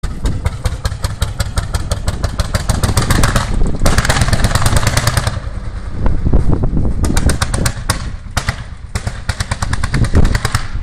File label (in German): Klingelton Lanz Bulldog; Lanz Bulldog Sound